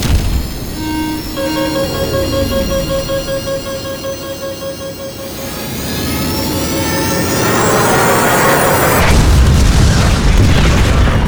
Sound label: Crash